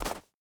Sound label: boar footstep snow hard; Rust